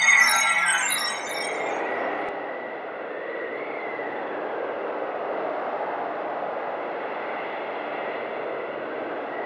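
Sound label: frozen sigil loop; tusk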